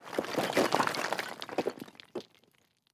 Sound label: impacts; World of Tanks